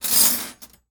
Silverware Pulled from Drawer, Kitchen; 1001 Sound Effects

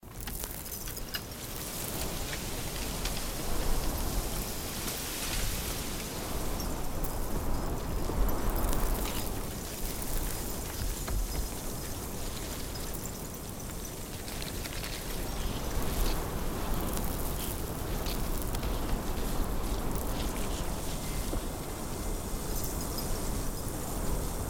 No Bridge; Blind Quest - The Frost Demon

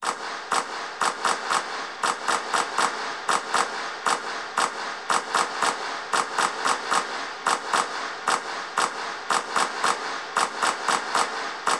Hockey Organ Claps

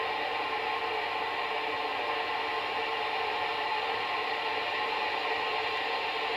oven; devices accessories utilities; NS Studios - ultra sound collection

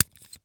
Needle Handle; Medical; Blastwave

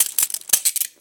Handcuff Cuff Hands; SFX Kit; Foley - Misc

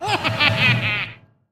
Lane Switch Middle; Trickster; AudioWizards

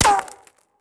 Bullet Ricochet; Sound Ideas; Guns

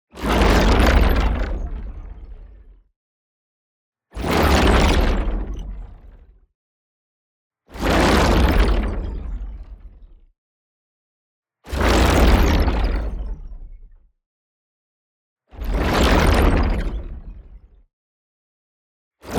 WHOOSH LARGE Nanotech Ray; boom library; Matter

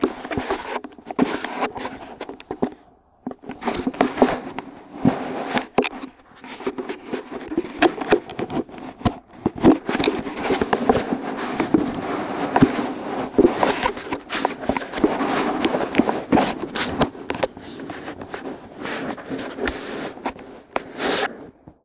unboxing speakers; devices accessories utilities; NS Studios - ultra sound collection

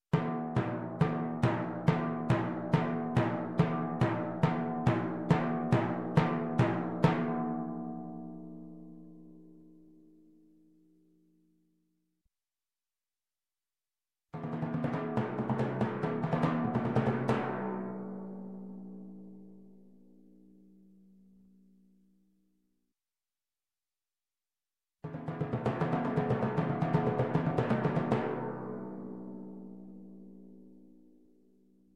Timpani fanfare, Music, Percussion, Drums; Sound Ideas; Series 2000

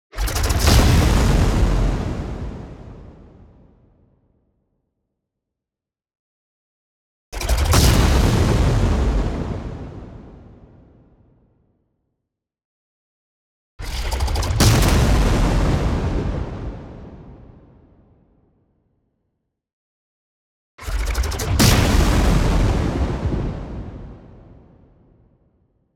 EXPLOSION LONG Lead In Noise Distortion Tail; boom library; Anime Essentials

